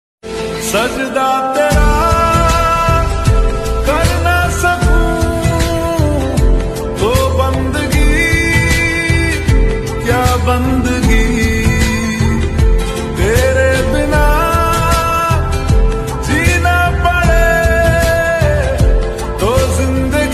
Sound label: lofi Urdu Arabic